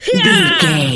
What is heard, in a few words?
voice female attack